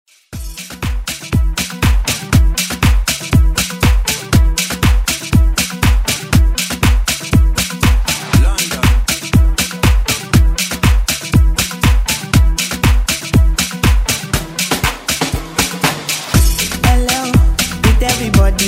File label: afro house redrum